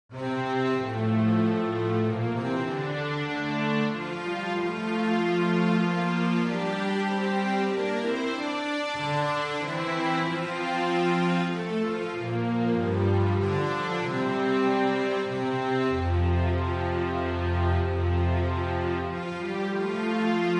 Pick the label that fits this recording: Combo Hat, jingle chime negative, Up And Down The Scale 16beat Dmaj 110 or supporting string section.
supporting string section